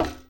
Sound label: metal step; Original TK Sounds